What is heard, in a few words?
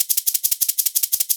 D&B Percussion Loop; neuro